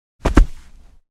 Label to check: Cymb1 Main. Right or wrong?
Wrong